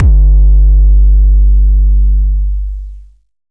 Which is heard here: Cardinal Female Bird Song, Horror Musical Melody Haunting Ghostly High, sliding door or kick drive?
kick drive